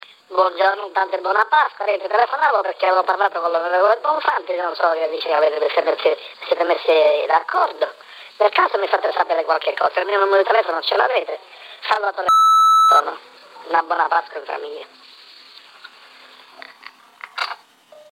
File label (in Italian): accordo